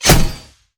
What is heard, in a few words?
knife metal, Damage